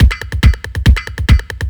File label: bass